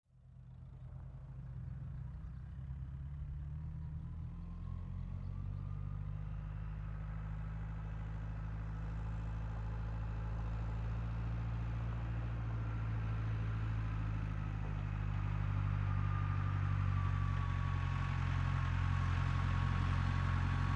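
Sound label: Tractor Farm Up 3 Point Turn Away; Tractors; Vehicles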